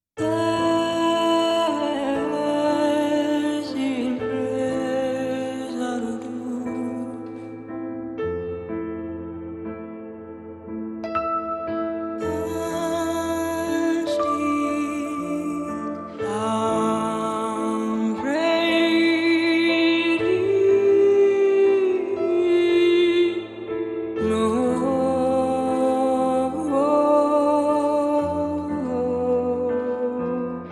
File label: Stream Underscore, Percussive Motion; Narrative Mind